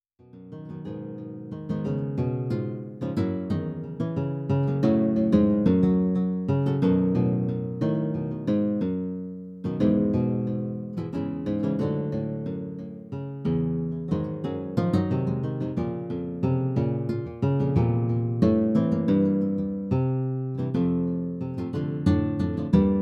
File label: Guitar Solo